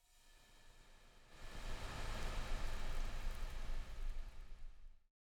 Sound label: Slight Movement Of Waves